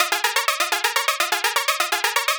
Frigged Juno, Synths, Underground UK House & Electro; Sonic Foundry (Sony Creative Software)